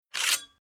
Weapon Sword Old Drawn From Sheath Fast Metalic Scrap; Tom Hutchings